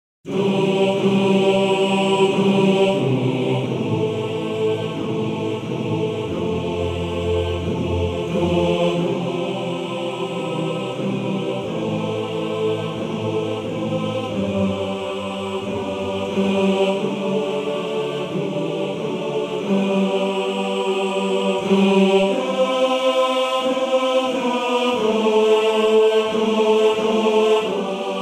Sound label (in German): Chor; Morgenrot